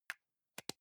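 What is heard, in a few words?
deodorant can lid close soft, badezimmer; haus-garten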